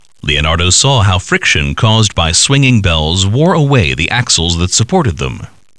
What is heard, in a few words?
BELLS